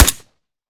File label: fire sup; gmod; arccw uc commons attachments